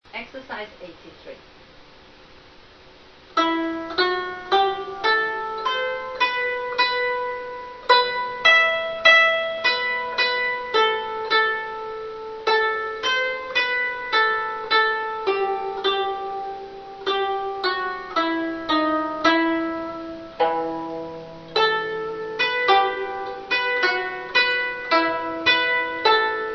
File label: zheng